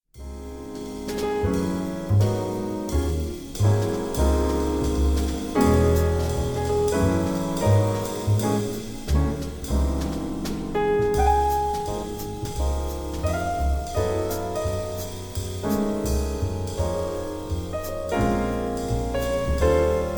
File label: Jazz; Chesky Jazz Artists Demo